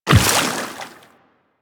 Run Water, Footsteps; DE; Warframe; Tenno

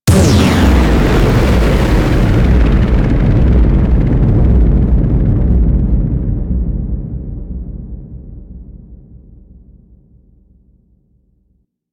Archwing Giant Space Explosion; Explosions; Warframe

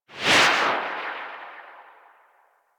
Atmosphere Flyby Left, Vehicles Movement; Warframe; Archwing

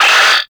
Screen Flicker; Warframe; Duviri; Easter Eggs

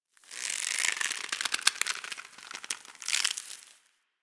Tendon Stretch Medium Close; Ambience; DE; Warframe; Infested Eris

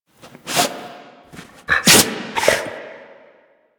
sneeze, Kubrow; Enemies; Warframe; Tame

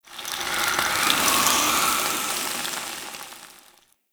Infested Pistol Random, Weapons

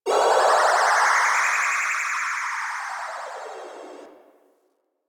Arcade Misc; Warframe; Ambience; Nineteen Ninety Nine

